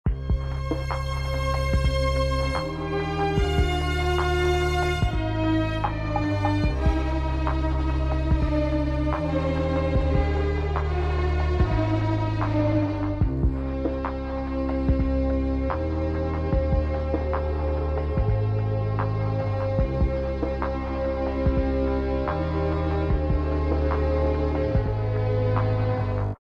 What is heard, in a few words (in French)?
musique intro; site gta4